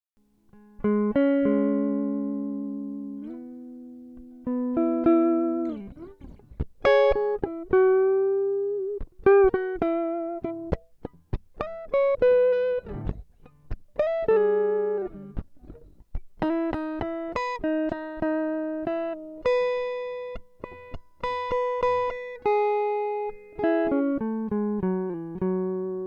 C#m100 acoustic

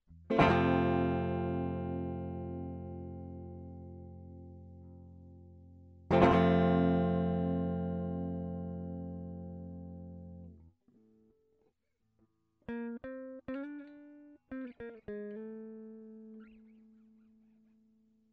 guitar phrases

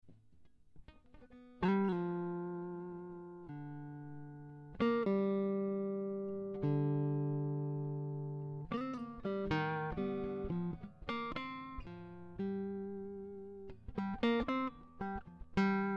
Groove

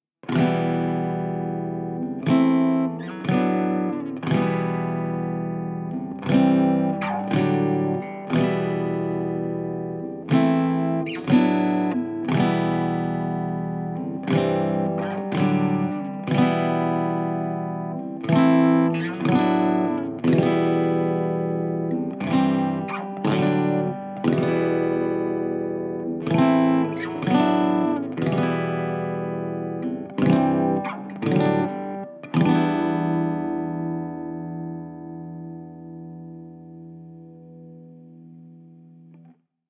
Em 120 GTR rock motif

